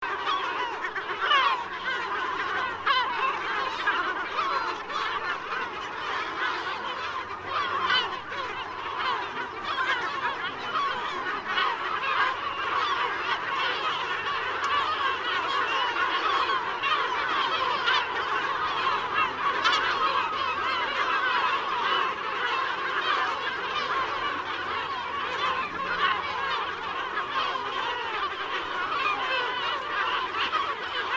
Gabbiano Reale

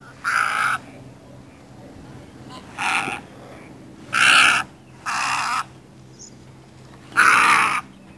Garzetta

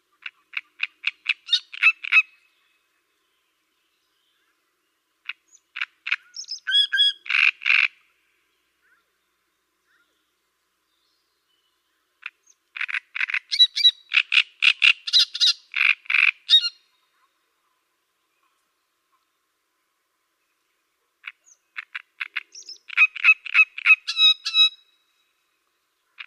Cannareccione

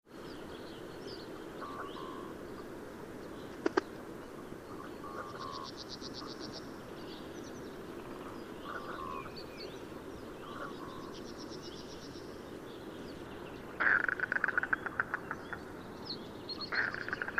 Smergo Maggiore

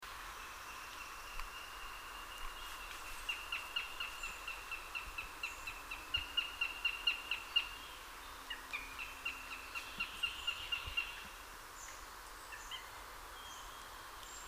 Astore

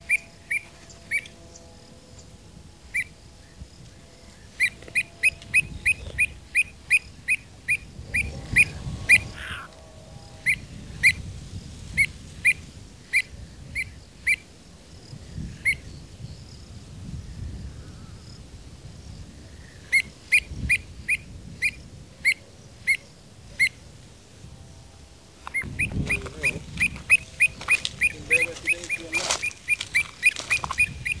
Avocetta

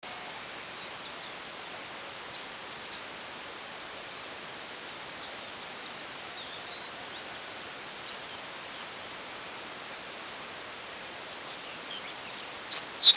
Zigolo Capinero, uccelli

